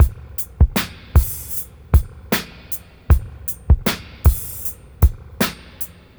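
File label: 78 break FX, drum loops, dub; Drumdrops In Dub VOL-1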